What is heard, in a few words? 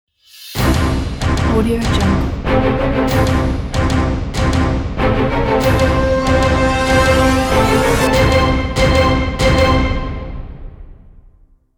cinematic logo intro